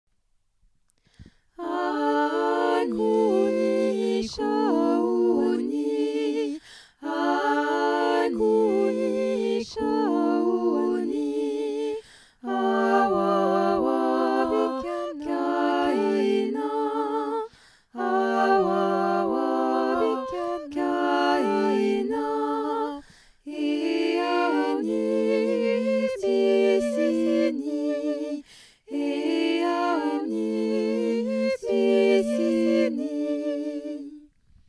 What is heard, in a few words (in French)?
tutti; ani couni